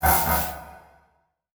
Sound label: Transition Blast Pulse; Production Element Title Transition